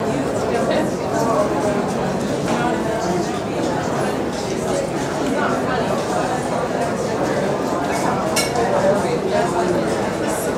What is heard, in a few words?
Restaurant Loop Med, restaurants & cafes